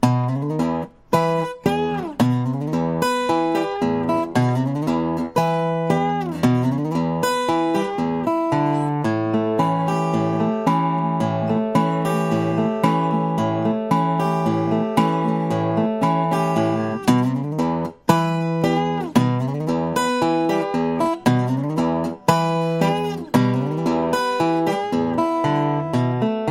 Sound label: Railroad blues